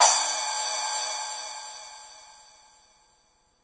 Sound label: rs7000 hh cy e7, Yamaha RS7000 Cymbals; drum machines